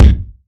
bass drum sound b key, Royality free bass drum sound tuned to the B note. Loudest frequency: 253Hz